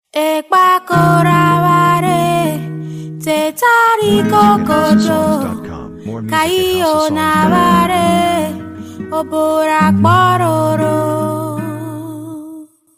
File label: Instrumental